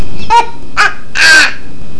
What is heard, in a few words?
silly laugh; Maniacal Laughter